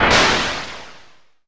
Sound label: Combat; Easyrpg; rtp2k3